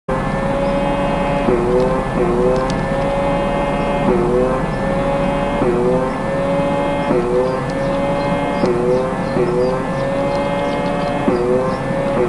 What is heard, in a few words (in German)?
pumpe